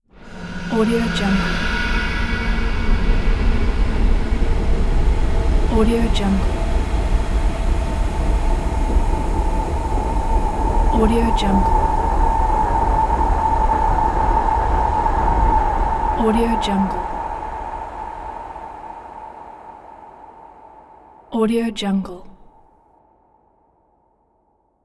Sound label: industrial steam nightmare